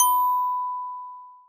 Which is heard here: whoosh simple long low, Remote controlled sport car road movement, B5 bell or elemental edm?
B5 bell